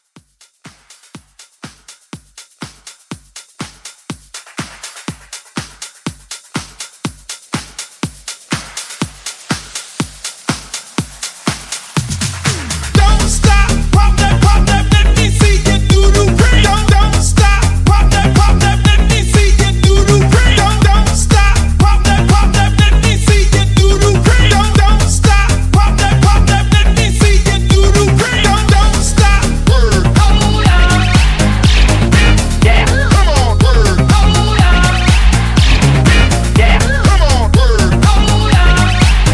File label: Club Hype 122